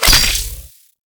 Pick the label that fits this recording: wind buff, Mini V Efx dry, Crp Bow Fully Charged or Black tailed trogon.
Crp Bow Fully Charged